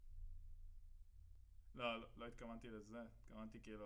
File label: Kit